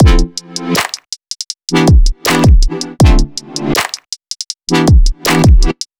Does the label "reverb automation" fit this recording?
Yes